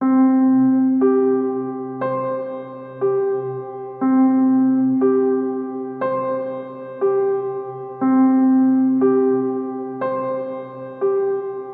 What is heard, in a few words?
Spark Of Opportunity, No Drums